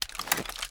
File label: reload empty xmag shake; gmod